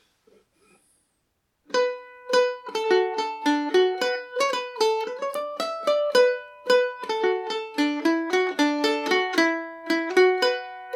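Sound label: E dorian, irish mandolin; Pat Horgan’s Number 2; G&O #34 mandolin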